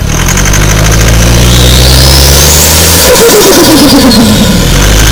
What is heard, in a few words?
turbo flutter sound effect; fortuner